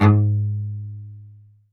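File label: G#1 SPICCATO, CELLO; Northstar; New Gold VOL-2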